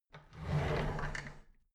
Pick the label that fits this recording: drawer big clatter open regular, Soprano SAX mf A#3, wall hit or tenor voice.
drawer big clatter open regular